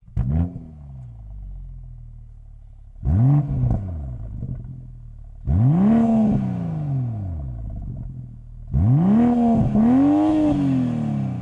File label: Slip On Line Titanium BMW M440i G22